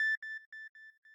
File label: sq lead